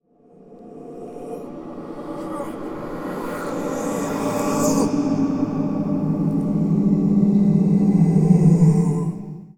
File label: Hauntings, Strange Ambiences; Sonic Foundry (Sony Creative Software); The Electronic Music Manuscript Collection